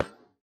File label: tile